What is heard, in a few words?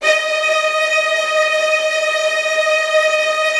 STR TREMOLO, Strings